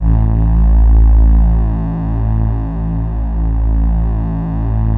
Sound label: RESONANT A1, synth; Strictly Synthe3; E-MU Producer Series Vol. 6 – Analogia Project